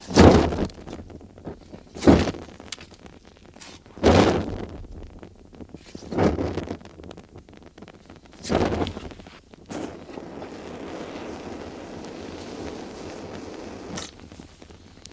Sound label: Benzine Pouring On F